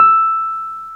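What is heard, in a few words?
Wurlitzer electric piano E2; Keyboards of The 60's and 70's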